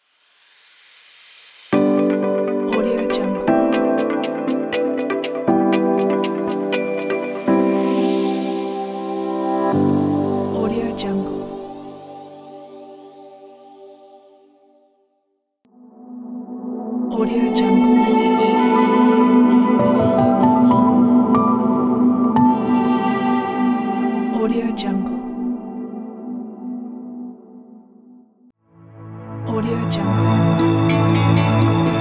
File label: medical relaxing yoga pack